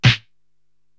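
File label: snare drum, Drum Machines, Korg TR-Rack; 300 Drum Machines; Sluggo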